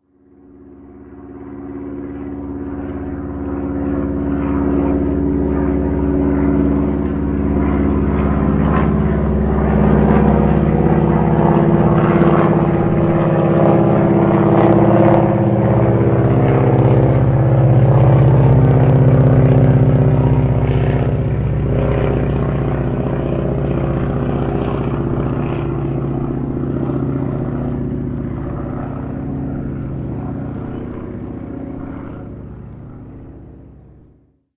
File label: airplane pass by